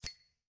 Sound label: Windows Menu Command; Glass